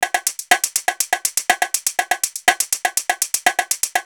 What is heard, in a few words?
727, drumloops, DrumMachines; X-Static Goldmine